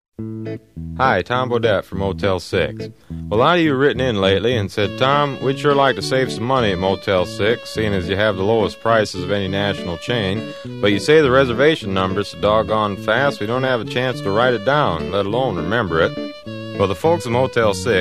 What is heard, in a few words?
singing phone number; motel6